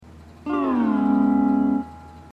hawaiian piece

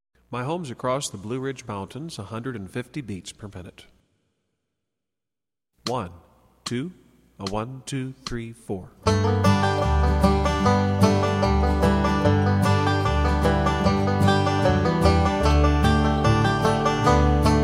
150bpm, banjo

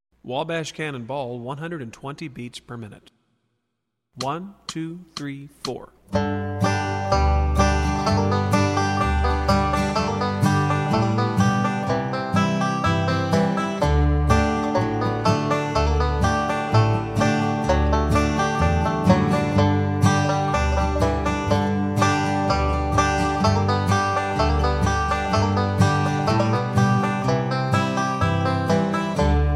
120bpm, banjo; Wabash Cannon Ball

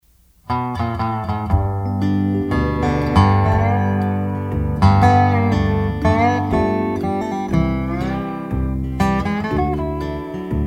EBDGBD, steel

